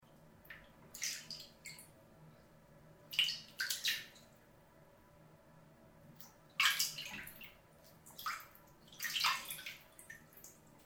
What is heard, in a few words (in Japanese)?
お風呂で一緒に入ってる人の腕を優しく離す音